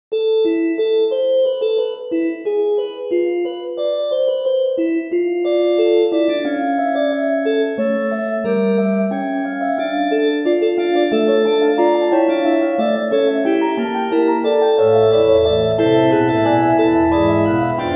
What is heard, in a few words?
castle organ